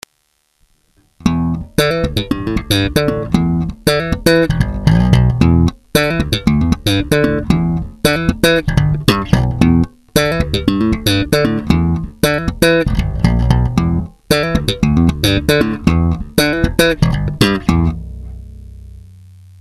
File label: funky